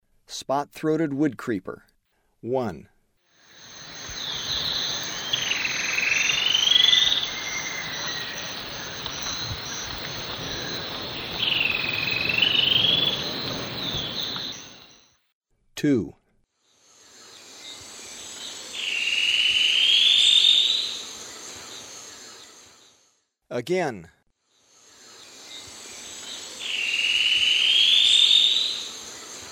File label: Spot-throated Woodcreeper; S. America; Eastern Lowlands